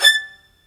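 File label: MARC VLN E5, violin; AKAI S-Series CD-ROM Sound Library VOL-2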